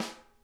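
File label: center, snare; Sonic Reality; Interactive Drum Kits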